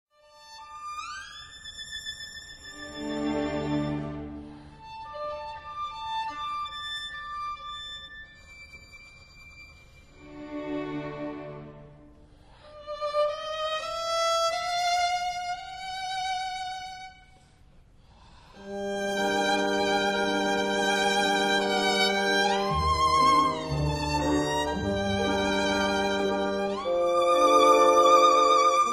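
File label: Scène de la Csárda for violin & piano; No. 5; Op. 33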